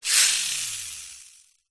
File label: leisure toy plane pull back motor release whoosh